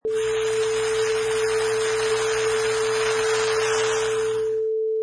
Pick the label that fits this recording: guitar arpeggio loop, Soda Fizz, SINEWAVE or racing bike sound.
Soda Fizz